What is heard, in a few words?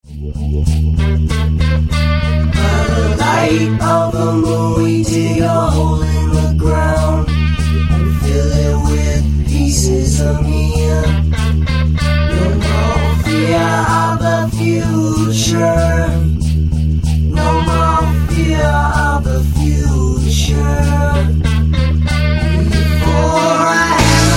drum school music; afterbeat